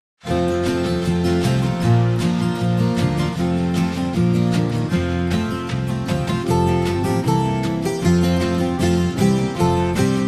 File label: Backing